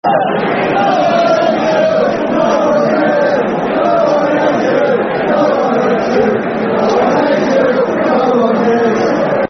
No-One Hates You No-One Cares, Football Chants; Crystal Palace Cpfc

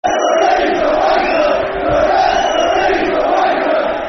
The Referee's A Wanker, Football Chant; Swindon Town Stfc

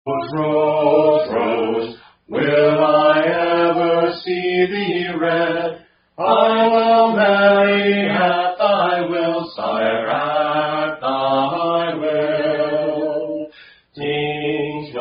Peace Round Cacophony Mix

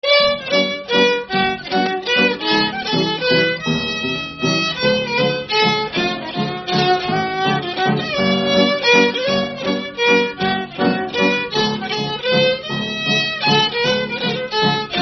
Waltz; Nameless Waltz

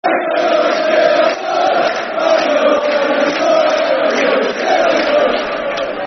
Yeovil Till I Die, Football Chant; Yeovil Town Ytfc; fanchants

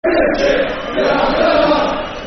Laff, Football Chants; Premiership; Cardiff City Ccfc

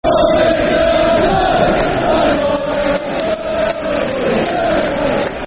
Football Chant (Soccer Songs); Pooly Till I Die; fanchants; Hartlepool United Hufc

